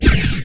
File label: Fire Gun; Ricochet